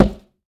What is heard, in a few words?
Plastic Dustbin, household rhythm samples Hits